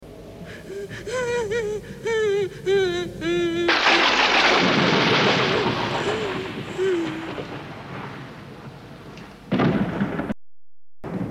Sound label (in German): weinen, Horrorgeraeusche